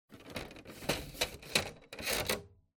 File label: Printer Rear Tray Open